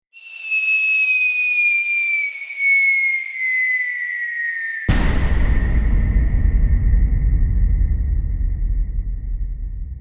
Bomb Dropping, Ascending bomb whistling with explosion.; Bombs; Explosions